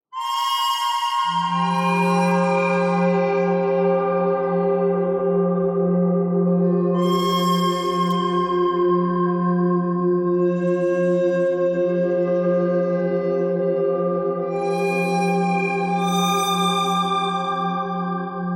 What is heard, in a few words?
bird thread simple